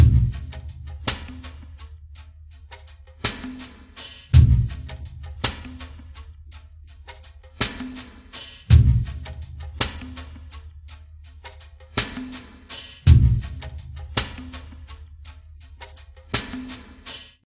Jungle Level

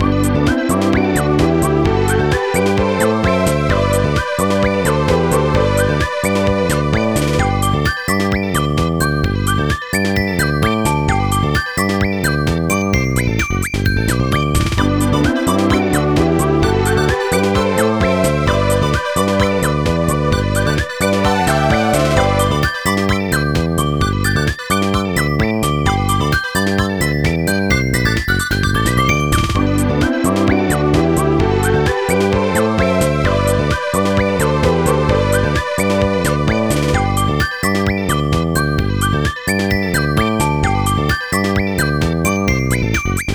BGM Menu; Space Bust-a-Move; NDS

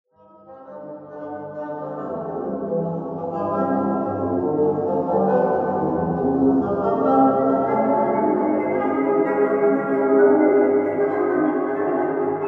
Jazz; Jazz Interiortwo

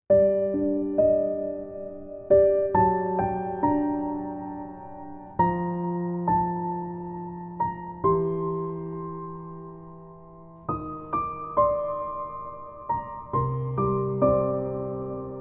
Lullaby Piano; stasis

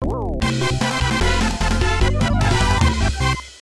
Round Won; Deroonderodero; arcade

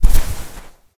Footsteps footstep sand land; ProSoundCollection v1 3 MONO

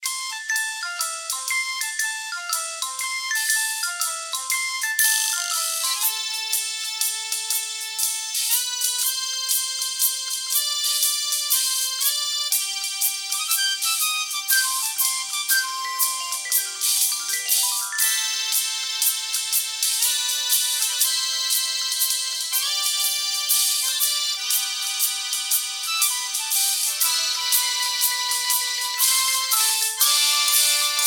Elevator Muzak; Mass Effect

